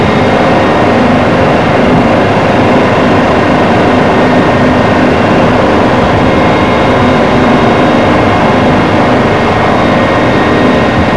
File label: motor, Train; OpenBVE; 55022 Royal Scots Grey